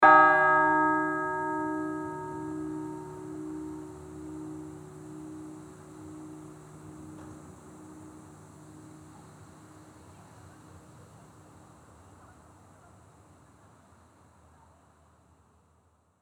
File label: Untuned Bell Alone